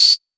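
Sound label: CR78 Tambourine; Drumset 03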